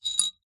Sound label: foley glass marble drop into empty glass bottle; zapsplat